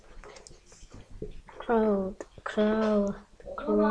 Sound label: crowd